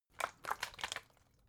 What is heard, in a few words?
bottle water big shake single soft; gastronomie; berufe